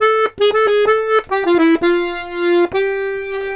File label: accordions; USB Soundscan vol.40; AKAI